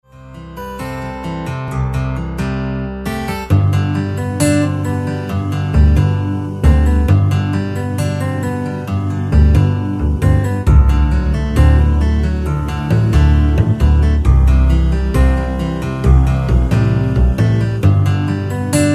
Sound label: hawaiian guitar